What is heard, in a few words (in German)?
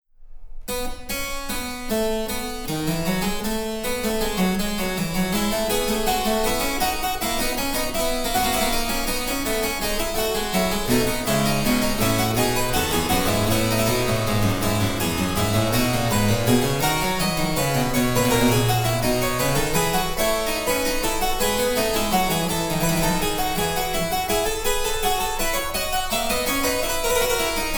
Cembalo; Mark Lindley WTC 1